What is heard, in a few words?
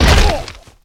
Football Hit Tackle